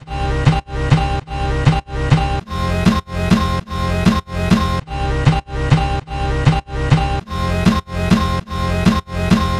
Melody Loop BPM 100 D; Gully Loops

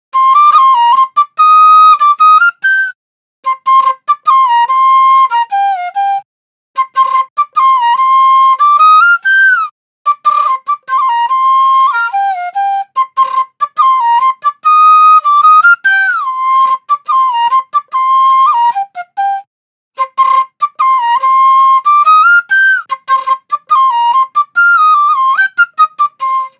Drill Flute Loop BPM 145 C Min

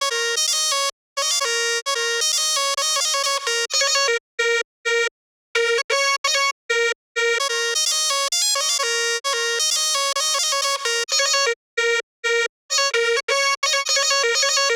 Chop Loop BPM 130 C; Bihu; waves of bihu vol 2; Gully Loops

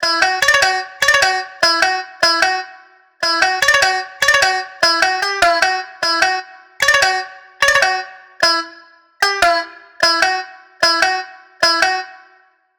Melody Loop BPM 150 F Min; Gully Loops; Mahi

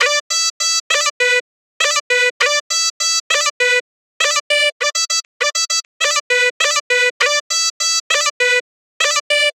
Chop Loop BPM 100 B; Bihu; waves of bihu vol 2; Gully Loops

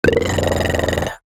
Mouth Bass; Aawaz; Gully Loops

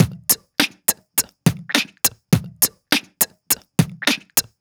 Beat Box Drum Loop; aawaz

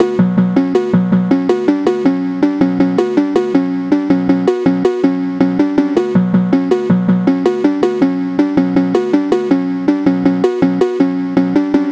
Phonky Melody Loop BPM 161 F Maj; Bihu; waves of bihu vol 2; Gully Loops

